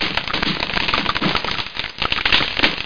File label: crush